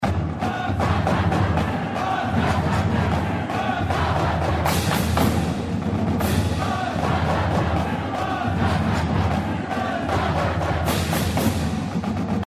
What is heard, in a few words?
cadence